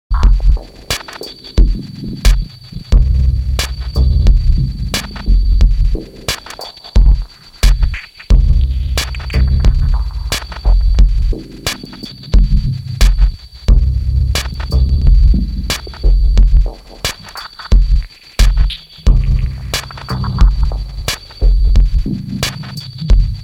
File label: Instrumental